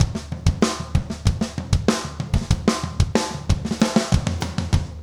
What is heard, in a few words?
rock toms 90